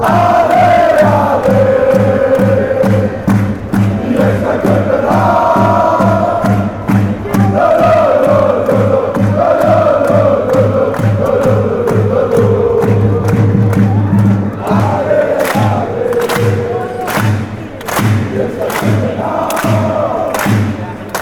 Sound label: allez allez fanchants; fc copenhagen